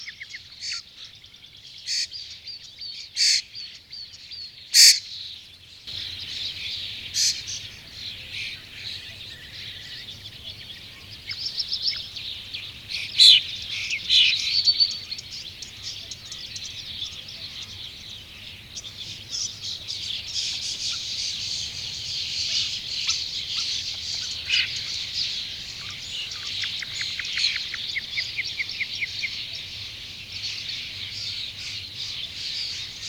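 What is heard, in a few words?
the starlings have arrived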